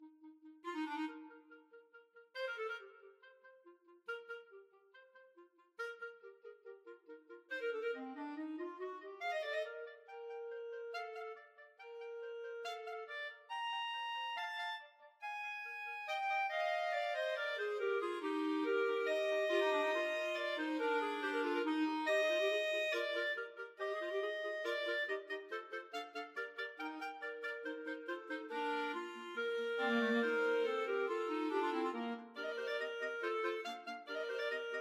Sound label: Overture CL, clarinet quartet